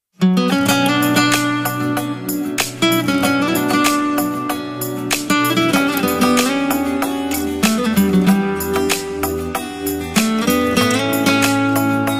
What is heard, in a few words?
uyghur song uyghur music